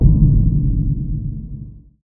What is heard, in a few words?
Impact; impact samples